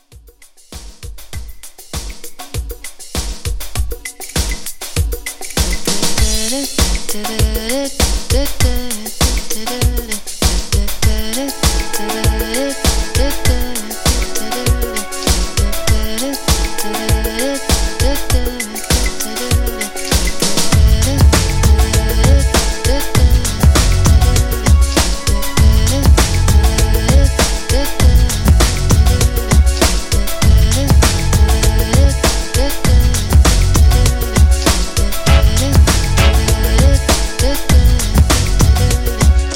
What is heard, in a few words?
80s Pop Re-Drum 99 CLEAN